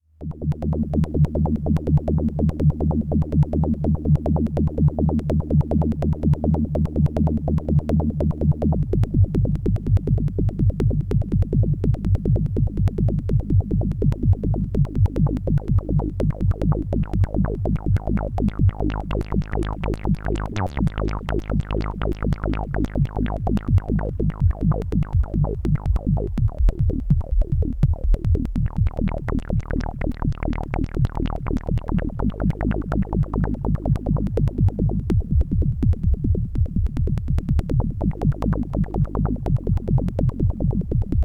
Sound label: arp2600 vc Pan bass